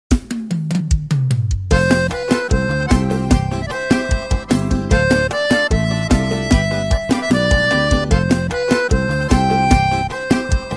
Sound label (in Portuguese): Playback Versao Forro; forro cristao sax alto eb